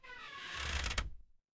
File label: door creak